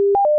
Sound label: beep